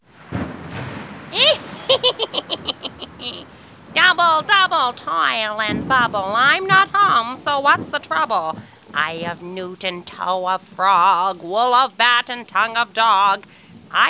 witch

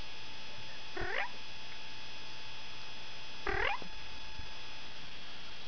Purr; Turkey calling

